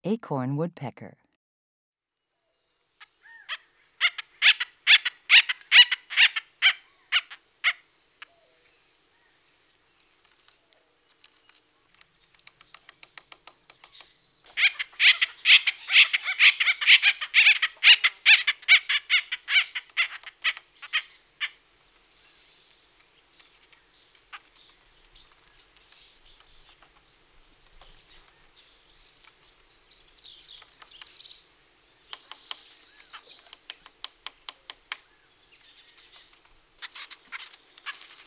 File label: Acorn Woodpecker